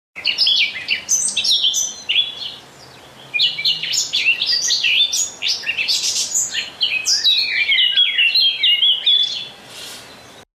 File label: blackcap song